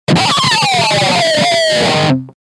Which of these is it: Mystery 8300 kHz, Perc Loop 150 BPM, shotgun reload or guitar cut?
guitar cut